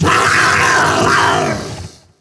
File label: medusa hit; DAoC